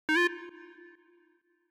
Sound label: noise